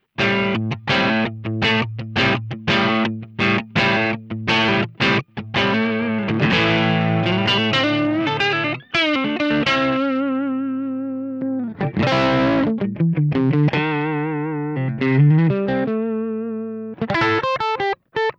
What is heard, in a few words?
Carl Martin Ampster Green High Gain